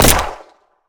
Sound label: pistol shoot; hygrounds